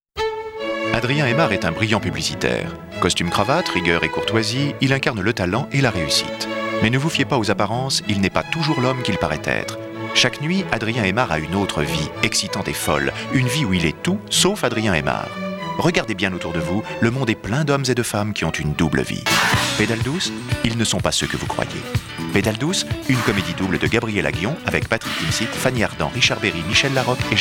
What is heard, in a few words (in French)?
pub pedale